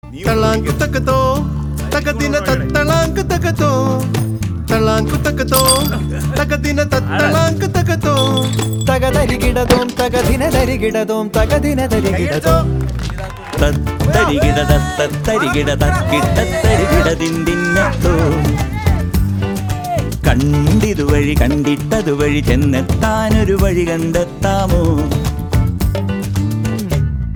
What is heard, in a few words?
Promo Song Ringtone